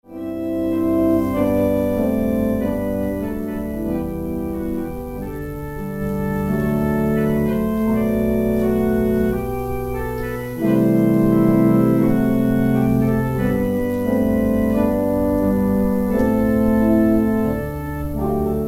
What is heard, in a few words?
organ interlude; Offering interlude